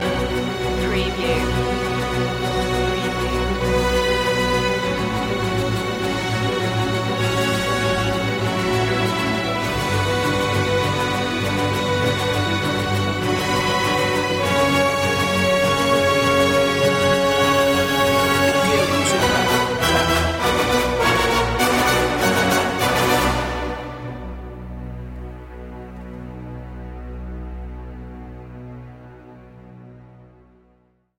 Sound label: no choir no perc